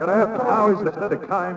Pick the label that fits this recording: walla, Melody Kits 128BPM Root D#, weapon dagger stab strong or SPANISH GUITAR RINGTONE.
walla